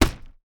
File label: impact; fallguys